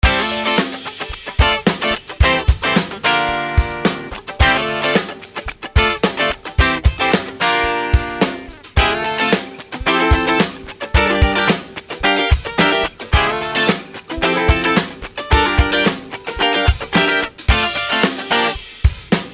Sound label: Guitar Funk